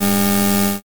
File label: weld